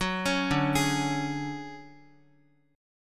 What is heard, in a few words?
D Major Sharp 11th D Guitar, chord; arpeggiated; JGuitar